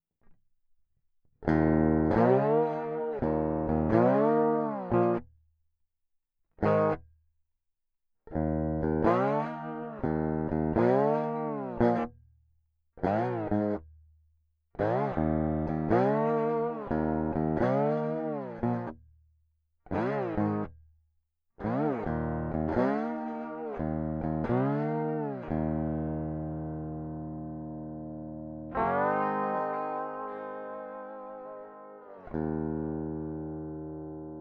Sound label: Crunchy Blues